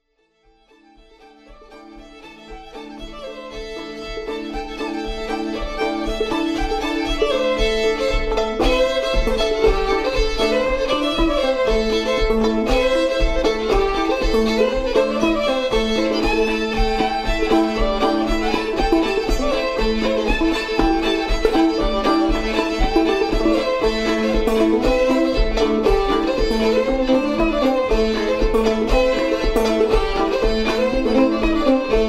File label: Cluck